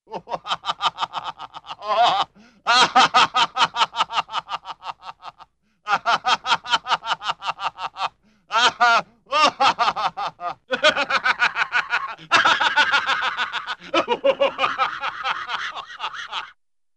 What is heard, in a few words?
Man Laughing - Becomes Hilarious Toward Tail; Cartoon; Hollywood Edge; Cartoon Trax